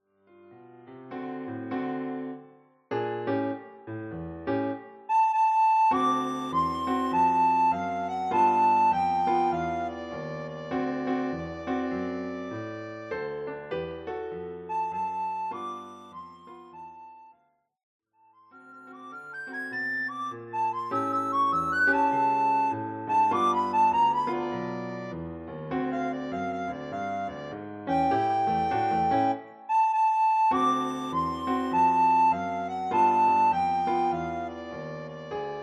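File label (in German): Sopranblockflöte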